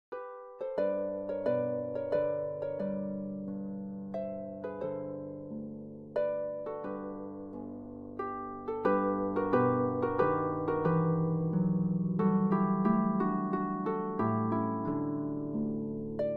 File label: Harp